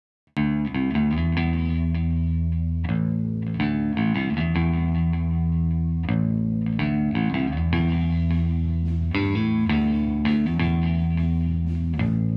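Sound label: M160 mono Bass (Guitar Amp)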